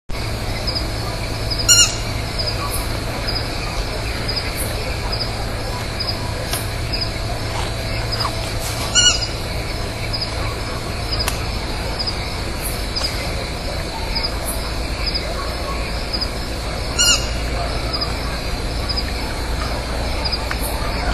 Asio stygius; San-Pedro; Jorge La Grotteria

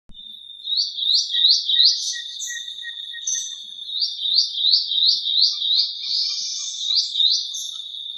Hylophilus poicilotis; Reserva Surucua; Jorge La Grotteria

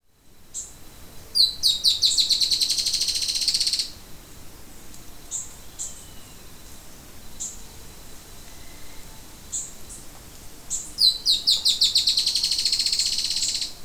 Drymophila malura, Tiluchi Estriado (Drymophila malura)

